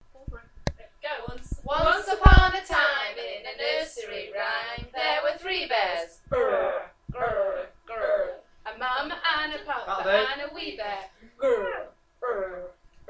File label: nursery rhyme